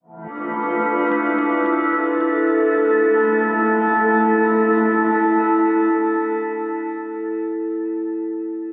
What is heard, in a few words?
Ambient Chords - Emin 110 bpm; MIDI Clips; Tonal; Atmospheric; Ableton; Factory Packs; Mood Reel